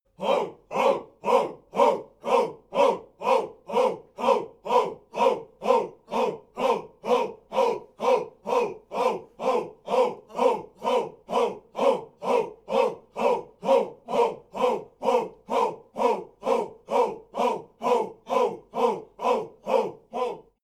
chants simple

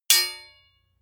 hammer hit metal ring bright

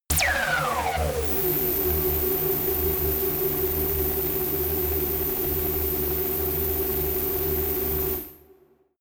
space ringing laser loaded

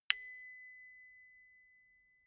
bicycle bell hit simple

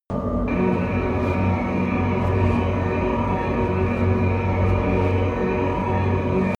promo pad

